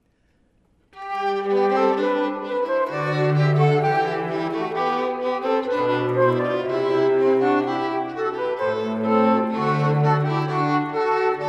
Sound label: flute cocktail passacaile; Flute/Violin/Cello Trio